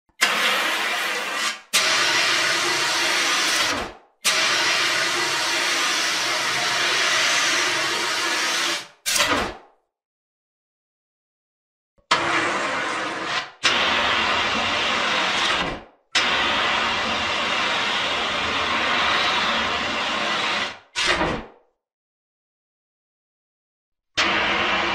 tiếng xịt bình chữa cháy cứu hỏa

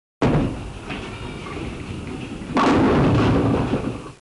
Am thanh tieng choi bong Bowling

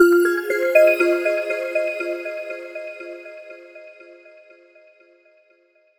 nhạc nền sự việc dần dần rõ ràng ra

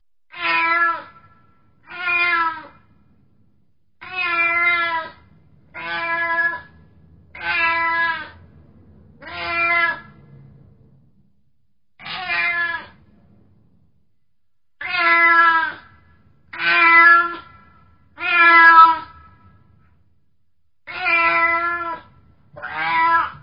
mot con meo so hai het len o loi vao hoac tren cay

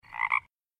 ech keu 1 lan

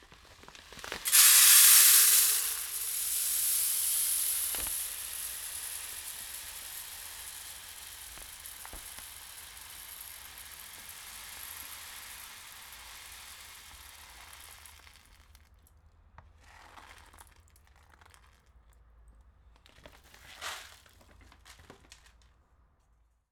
tieng do tui gao tui hat nho ra khoi bao

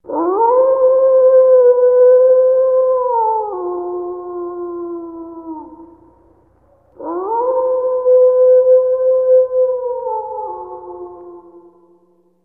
tieng cho soi chu len

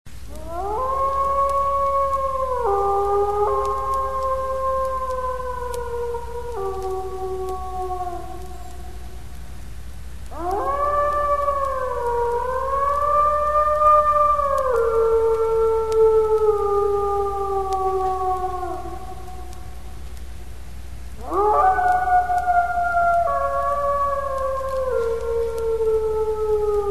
tieng soi tru trong tu nhien

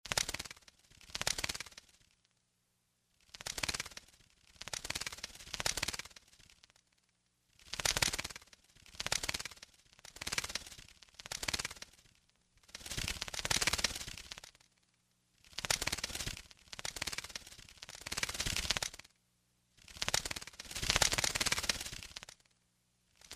chuyến bay của một con dơi

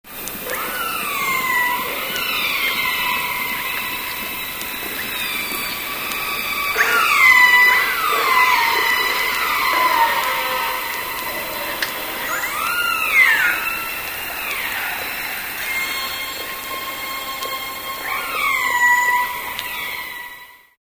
giong ca voi

